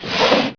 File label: slider; Microsoft Graphics Studio; Home Publishing 99